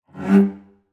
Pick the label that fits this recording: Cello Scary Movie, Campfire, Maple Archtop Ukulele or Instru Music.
Cello Scary Movie